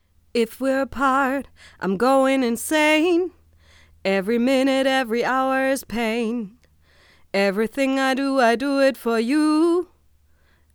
gesang; fame studio cu2

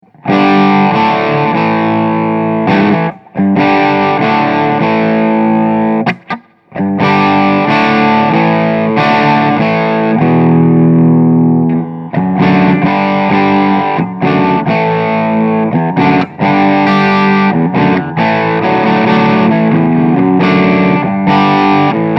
crunch steg pickup; hagstrom fantomen test

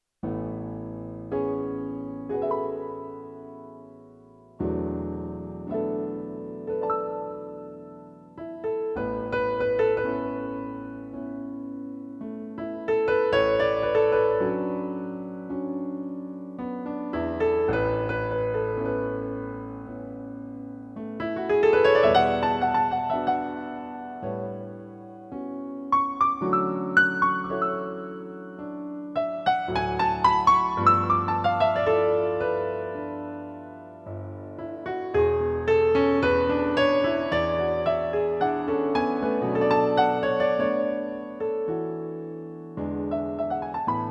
Yamaha P-255 test lang

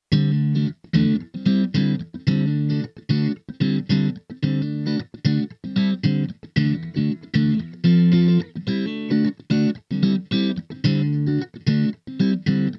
Rhythmus clean

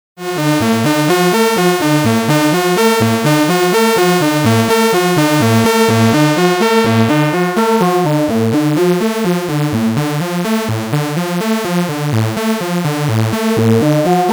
unisono filter automation; vermona perfourmer

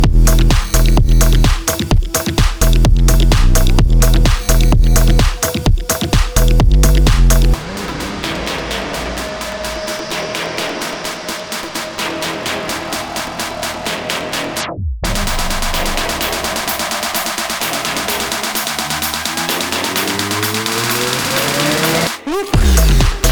edm build up gesamter track

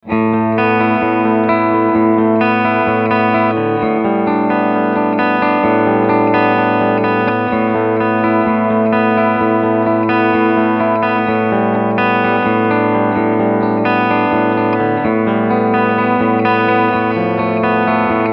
lag roxane racing 1500 stegpickup cleansound

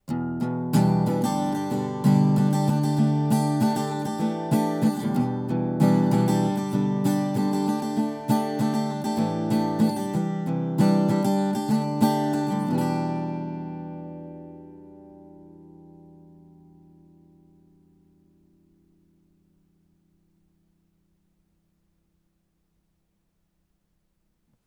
effekte a-gitarre

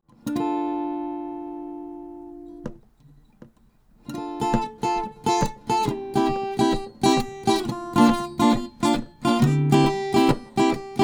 royer r-122 mkii gitarre rueckseite 40 cm

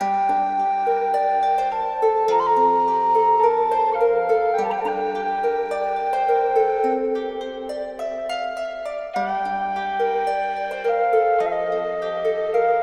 harfe floete mit Lexicon 224; best service era medieval

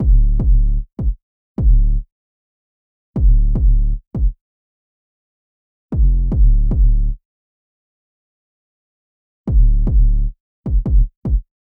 808 vorher; EQ Tipps für Beats; 5 EQ Tipps für Beats

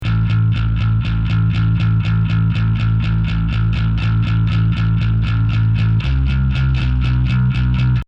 bass bearbeitet

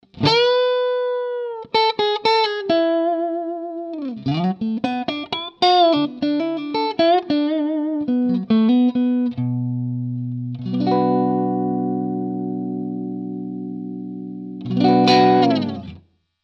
clean single notes hals split, gibson les paul standard

